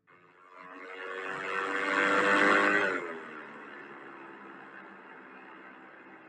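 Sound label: sound of plane takeoff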